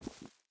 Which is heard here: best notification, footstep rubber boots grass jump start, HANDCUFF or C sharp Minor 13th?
footstep rubber boots grass jump start